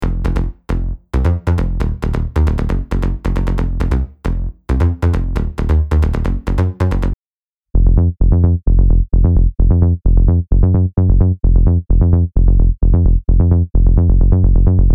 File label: Techno Melodic Techno